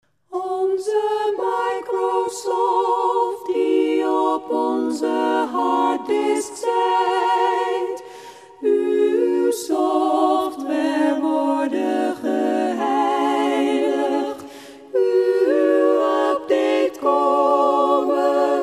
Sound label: ssaa